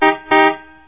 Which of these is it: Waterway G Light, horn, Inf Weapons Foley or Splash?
horn